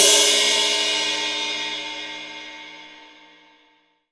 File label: 18 OLD, 18INCH OLD ZIL RIDE